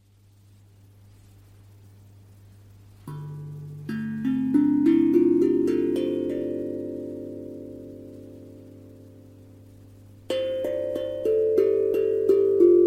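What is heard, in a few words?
D Celtic Minor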